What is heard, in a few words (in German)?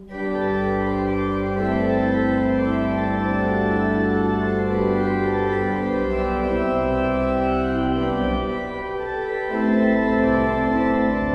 Breil, gebrauchtorgel